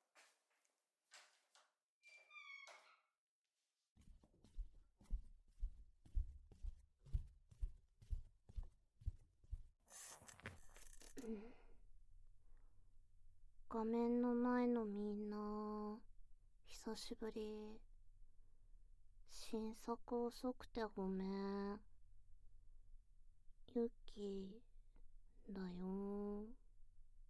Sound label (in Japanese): 耳舐め オホ声; 耳舐めからの完堕ちオホ声セックス